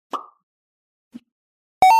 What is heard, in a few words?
start engine sound